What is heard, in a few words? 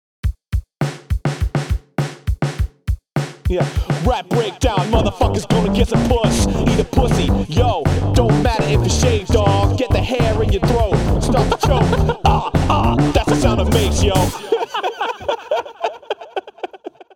rap breakdown